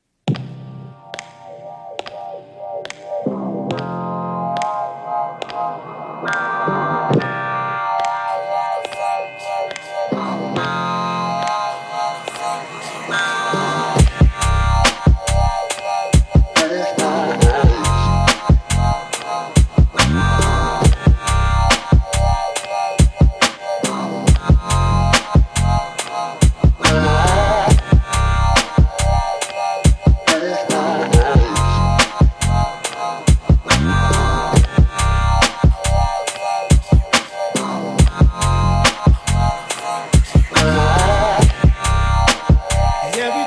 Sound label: Lofi Hip Hop Remix